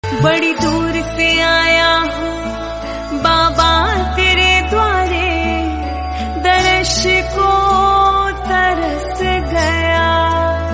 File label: Hindi